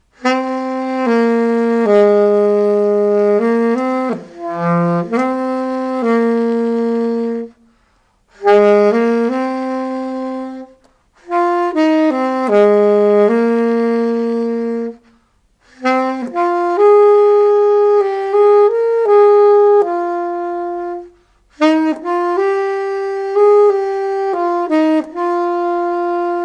messing around with the saxophone

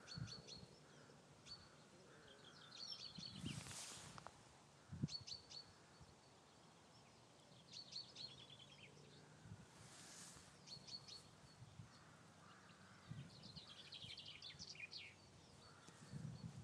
morning birdsong

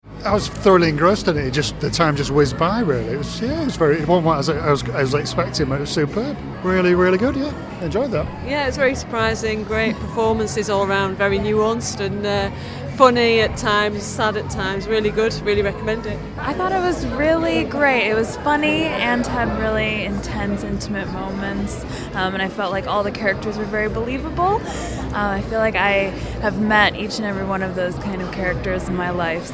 audience reactions; mirror transformation

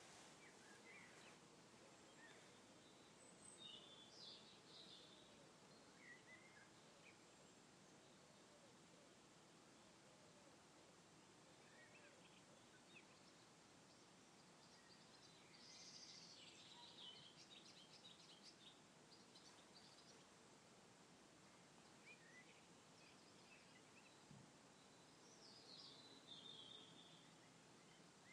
lincolnshire birdsong

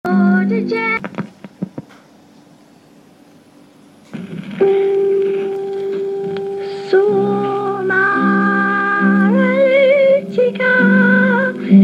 singing at 7 years old; circa 1957

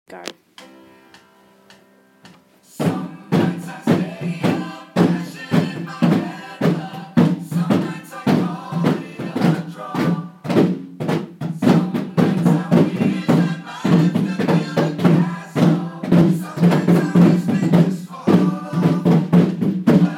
rhythm

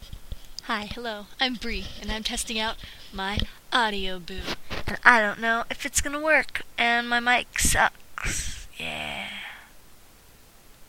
test ahem

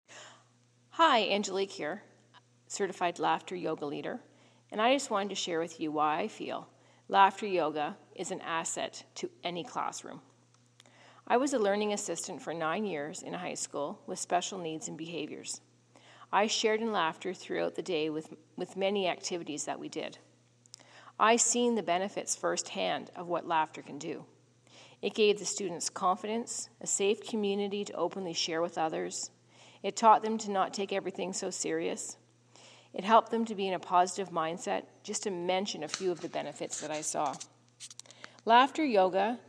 laughter yoga in the classroom

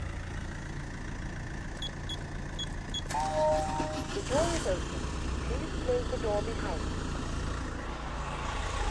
codepad door boo

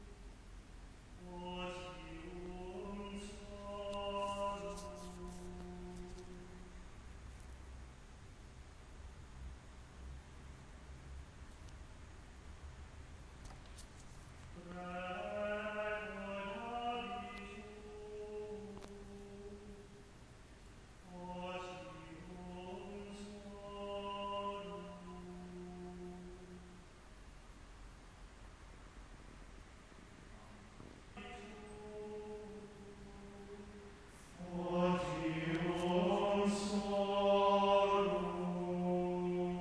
more awesome choiring

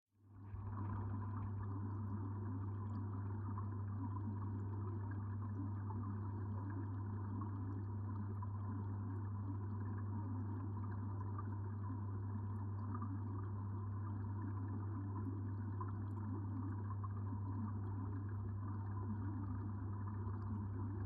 tiny fish sounds

